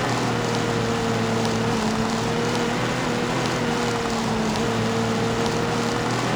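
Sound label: car drive, chicago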